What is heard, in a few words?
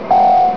Exclamation; Hal 9000